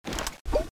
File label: draw; bloodhunter; weapons